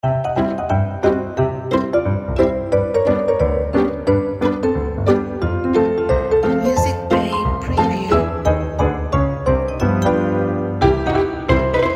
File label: Pixie Party